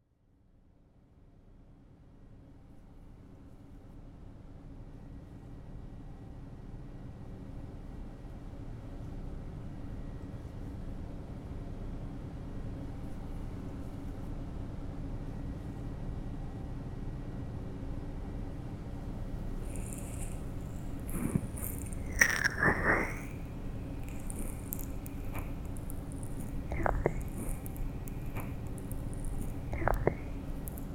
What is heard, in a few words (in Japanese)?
ステンレス耳かき（ステンレス耳かき・左耳）ASMR